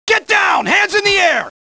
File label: police; cs1.6; cstrike